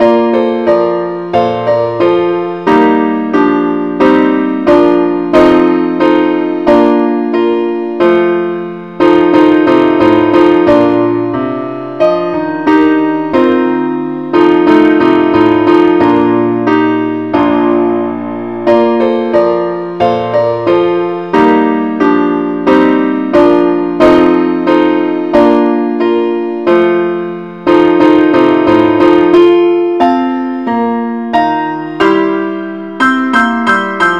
keyboard Only key bes; Welsh tune; Gwalchmai is a Welsh tune, named for the town of Gwalchmai

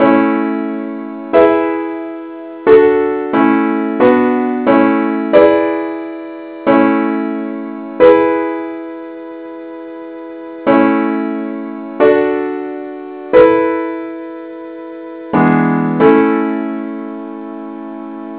gwalchmai simple Acc key a

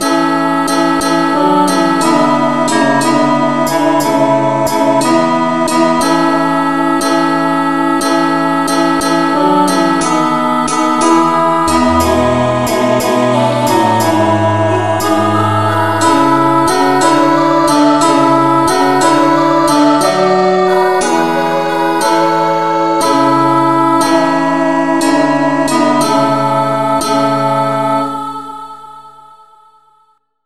no vocal key a; Angel voices, ever singing

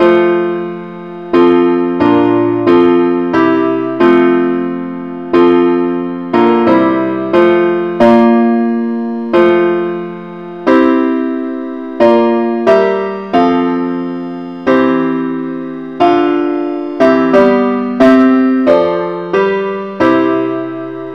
parts only, key f; christe Sanctorum

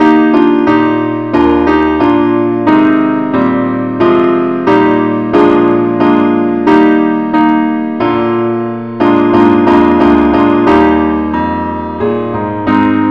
keyboard Only key ees; Welsh tune; Gwalchmai is a Welsh tune, named for the town of Gwalchmai

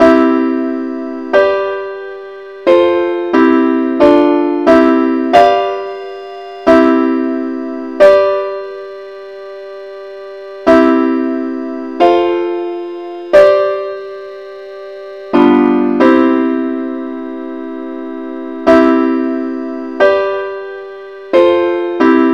simple Acc key c

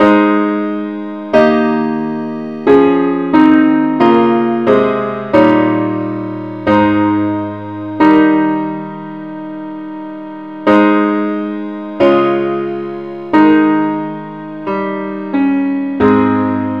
parts only key aes

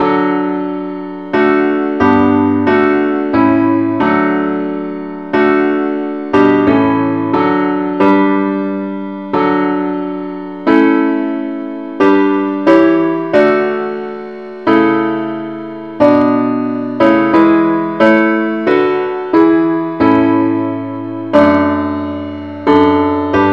parts only key d; christe Sanctorum

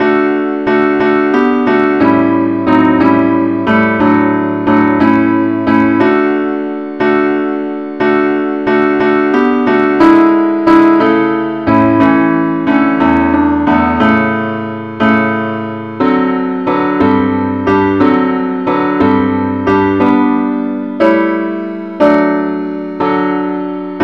parts only key d; Angel voices, ever singing

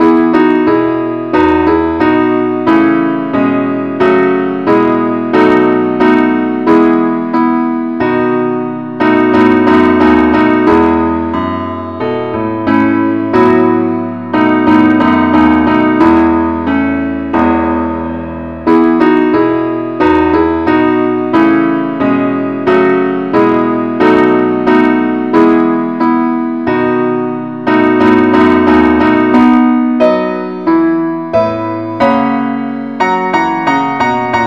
keyboard Only key e; Welsh tune; Gwalchmai is a Welsh tune, named for the town of Gwalchmai

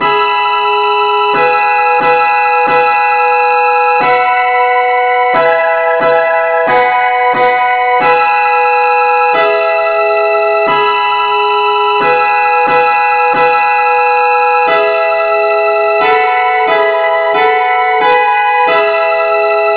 no vocal key e; henley

